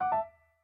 delete timeline; FreakyBlue; TWBlue

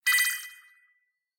search updated; FightingGames; TWBlue

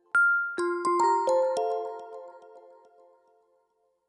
dm sent, Magical Fountain; TWBlue

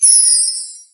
delete timeline; Magical Fountain; TWBlue

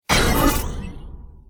trends updated; FightingGames; TWBlue

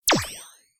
favourites timeline updated; FightingGames; TWBlue

